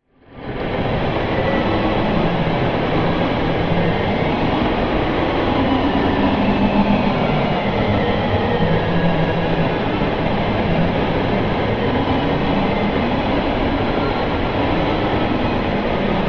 Music Eerie Psycho, guitar

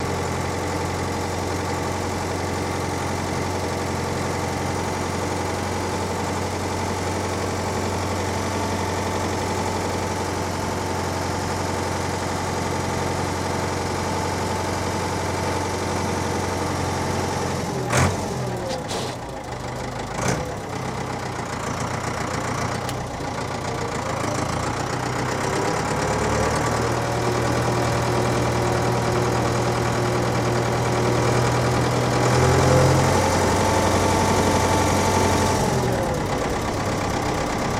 Forklift Idles Maneuvers; TFL Idles And Maneuvers

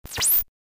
Blip Quick Burst Sta

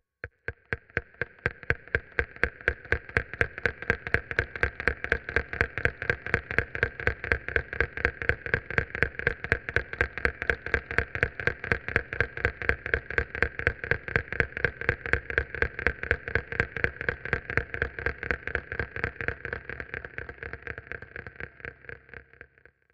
Tick Generator Machine; machines winches

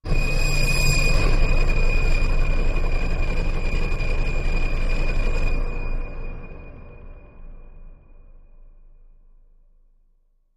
Jettison Machine High, spaceship fx

